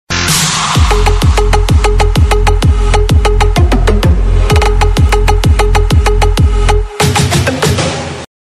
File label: Slow Techno Ringtone